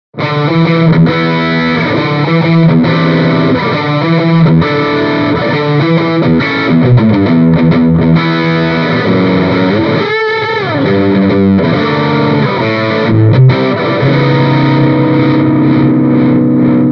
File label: Mic Inside G12H 65 Distortion Stratocaster; Mini Rox